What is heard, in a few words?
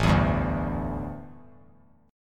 A 7th Suspended 4th, A, down; A7sus4 strummed